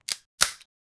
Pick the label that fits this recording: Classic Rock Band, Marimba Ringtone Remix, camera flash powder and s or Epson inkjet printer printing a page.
camera flash powder and s